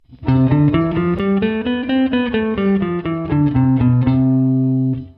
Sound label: single notes, guitar